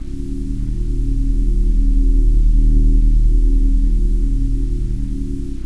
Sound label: low hum